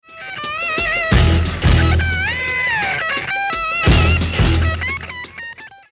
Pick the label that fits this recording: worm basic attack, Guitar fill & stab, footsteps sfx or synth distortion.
Guitar fill & stab